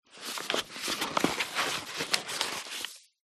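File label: food flour bag paper handling; flour bad paper handling; zapsplat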